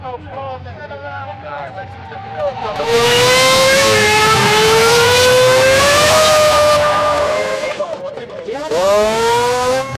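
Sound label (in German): formel 1 rennen geräusche, motors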